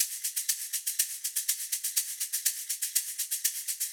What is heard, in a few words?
Shaker Loop 122bpm